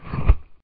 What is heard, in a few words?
parachute; hedgewars